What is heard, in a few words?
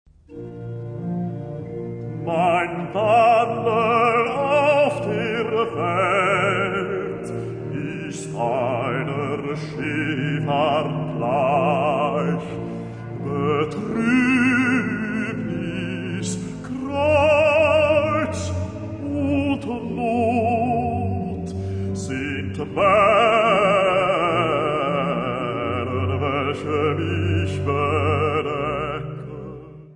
recitativo